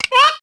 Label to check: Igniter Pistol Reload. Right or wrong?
Wrong